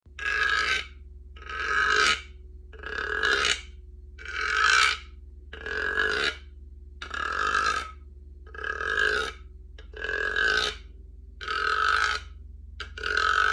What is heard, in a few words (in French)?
Guiro coassement crapaud